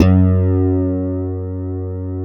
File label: WS DWB F#2, Korg Wavestation; Back In Time Records; Korg Universe VOL-1